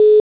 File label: Busy, phonetones Motorola Q9c